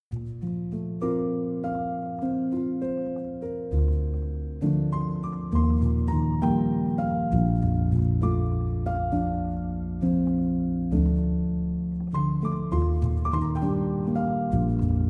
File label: Piano Instrumental